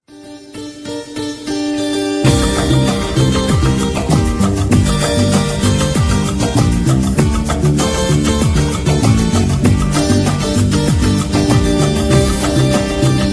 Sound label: latin guitar music; ringtones; latino